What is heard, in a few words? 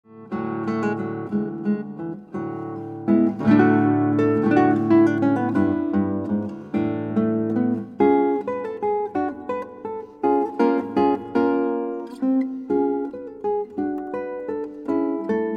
Guitar